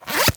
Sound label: zipper jacket